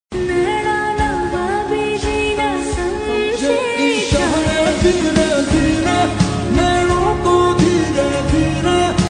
Lofi

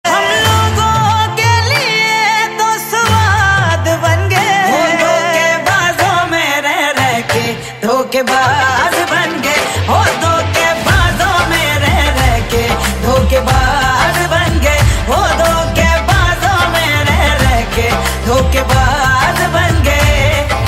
Hindi Song

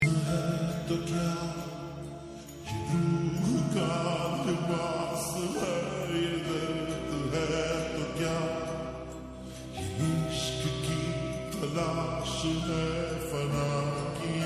Song Slowed Reverd

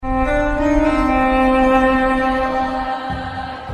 BGM Notification; Asur

